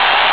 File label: crowd